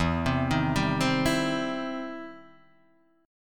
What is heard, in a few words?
E Minor Major 7th Sharp 5th, E, down, Guitar, Drop D